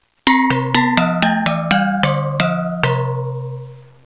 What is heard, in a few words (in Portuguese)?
erro; Adivinhações - CPII